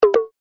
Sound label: miss sound